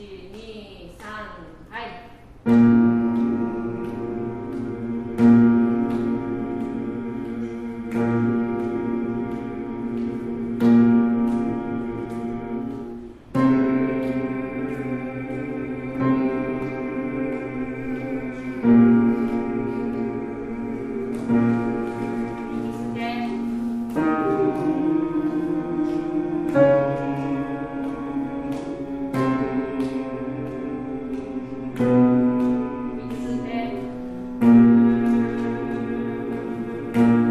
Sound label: bass, mannerchor